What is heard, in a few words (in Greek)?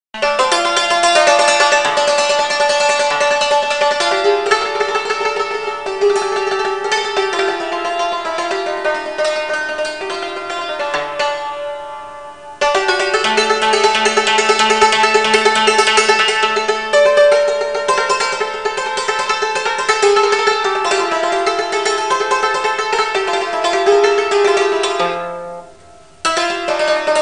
Σαντούρι